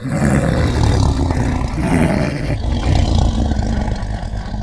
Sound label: vox growl loop